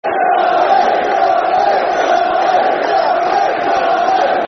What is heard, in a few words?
Football Chant (Soccer Song); Green Army, Green Army; Norwich City Ncfc